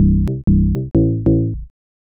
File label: carpenter arpeg, hh bass loops